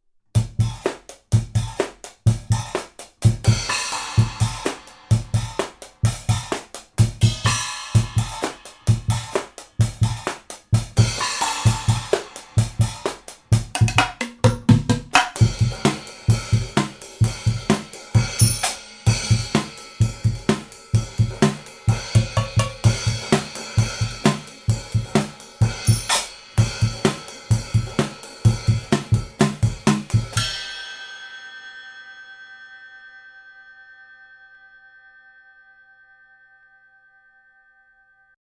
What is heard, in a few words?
Drums Voodoo